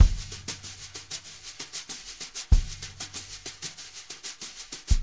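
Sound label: brush, drumscores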